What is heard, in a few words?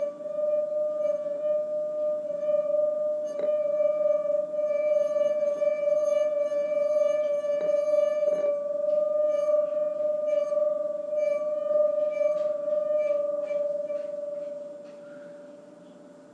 wine glass duet